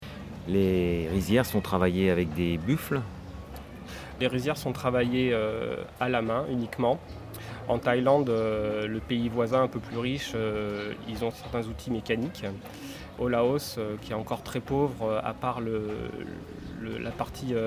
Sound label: EDM